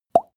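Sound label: plop water drop, body sounds; koerpergeraeusche; menschen; soundpool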